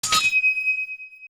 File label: Crystal Switch; zelda; OOT